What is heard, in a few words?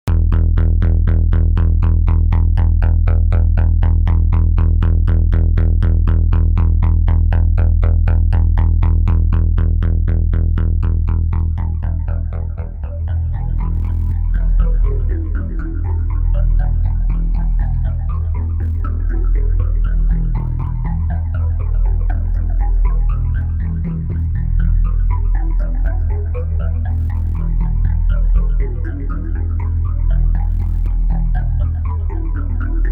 SE70 Space Phase